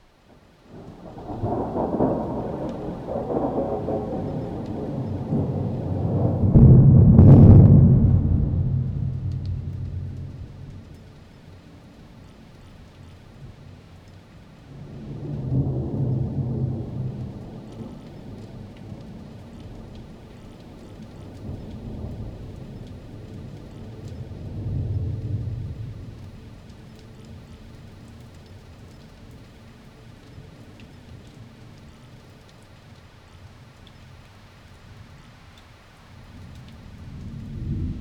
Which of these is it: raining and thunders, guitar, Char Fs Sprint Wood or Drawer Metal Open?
raining and thunders